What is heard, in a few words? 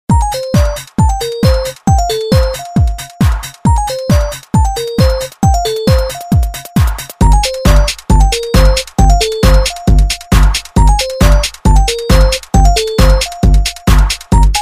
nokia dance ring remix, ringtones